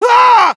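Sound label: pain severe, Spanish; Medic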